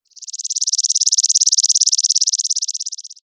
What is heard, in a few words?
Bird Call